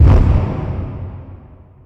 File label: Displacer Hit, Science Fiction Sci-Fi Electronic Alien Power Source